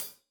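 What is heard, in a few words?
hat closed, hi-hat cymbals stereo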